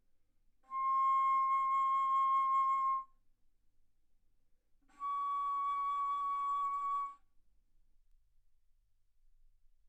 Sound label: Bass Flute C6 Db6; ff